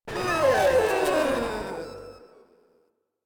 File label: Runner Death; Enemies; Vocals; Warframe; Proto Infested